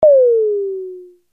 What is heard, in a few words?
syndrum